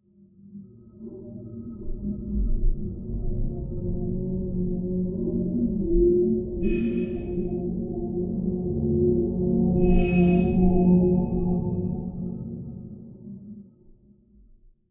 Game Over Loop

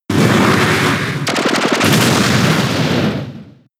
Belch; Pokemon; gen 6 attack moves; XY ORAS

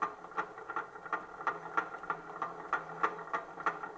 Elevator Creak Loop

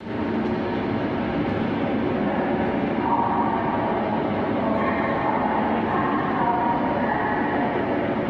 Ambience; Grimrush

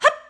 mom hup; Ringling Bros. and Barnum & Bailey; Wii

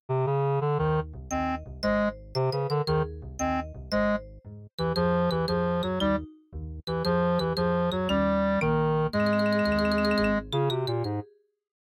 MIDI Animation stings

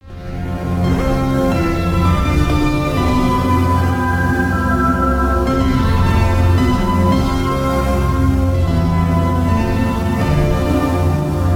Synth Tower Reveal; gamerip; door to door

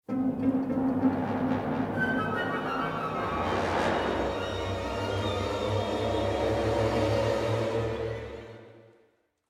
Sting Platform Rotate; Necropolis

